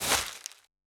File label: footstep softwet; The Swapper